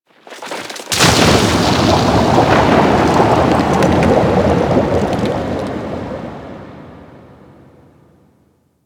Ocean Cave Crack Large, Ambience; Warframe; Grineer Ocean

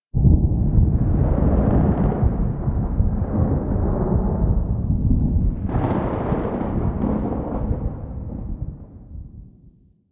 Exterior Rolling Thunder; Warframe; Ambience; Duviri

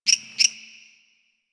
Water Creature, Ambience; One Offs; Creatures; Warframe; Quests; The New War; Drifter Camp

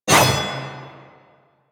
Sword Whoosh; Warframe; Teshin; Weapons

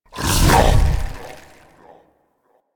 Dodge Cast; Warframe; Pacifist

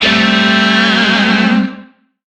Shawzin Maj Chord K; Music; DE; Warframe; Lizzie Shawzin

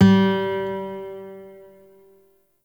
Shawzin Oct One G; Warframe; Lotus Shawzin

